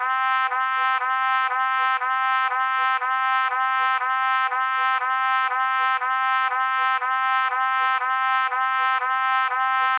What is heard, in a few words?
Separated Trumpet